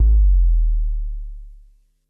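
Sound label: BD Sub, bass drum; Takebeat; Club; VEC1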